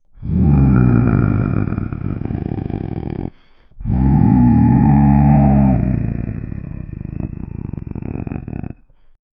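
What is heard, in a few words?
orc death growl deep guttural; humanoid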